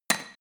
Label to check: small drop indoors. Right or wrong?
Right